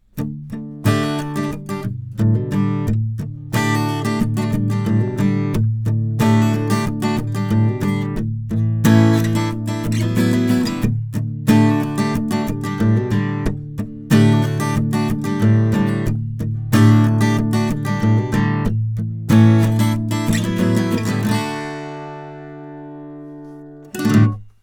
palm mute; m-audio fast track ultra

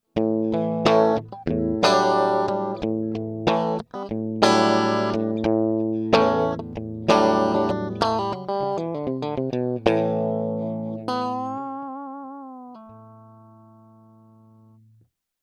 hi z alone

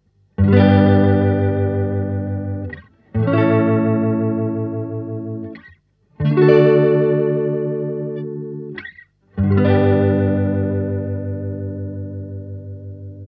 tele channel 1 vibratone, fender super champ x

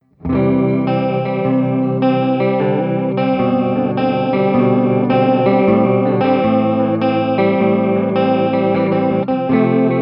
chorus-flanger, line6 spidervalve; effets

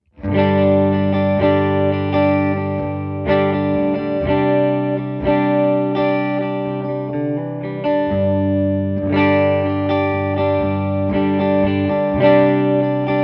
clean, line6 spidervalve

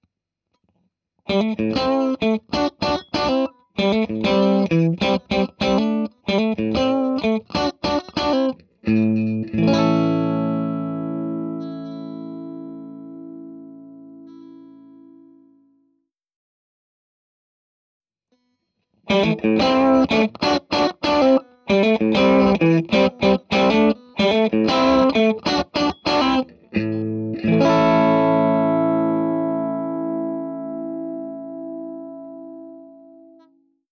blackface re 20 boost; randall mts

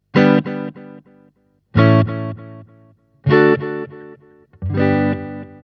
tele channel 1 delay, fender super champ x

